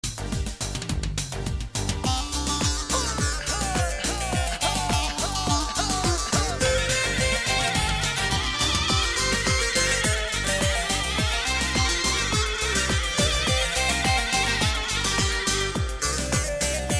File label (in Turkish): Kurtce Halay